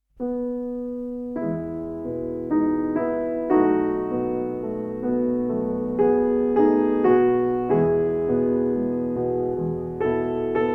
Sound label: rubato